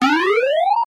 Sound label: Claw Goes Up